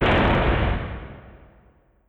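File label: Collision Exterior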